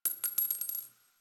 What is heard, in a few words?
Target Practice Shell Casing; Quincy; Warframe; Nineteen Ninety Nine; Quests